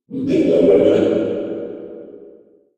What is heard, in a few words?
crab; Barotrauma; Barotraumatic; Icecrab